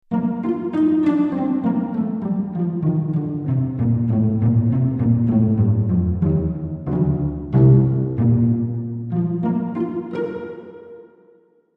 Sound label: pure space cello